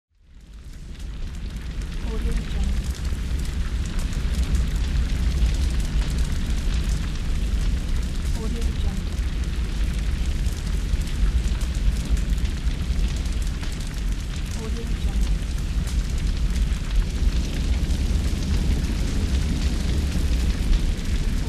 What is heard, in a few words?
big fire